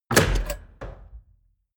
mechanics small reverberant

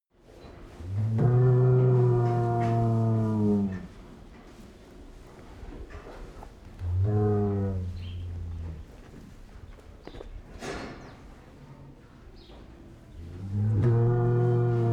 cow barn ambience

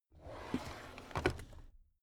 drawer cabinet stuff open soft

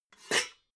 pots clank soft

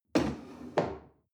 chair wood place slide soft

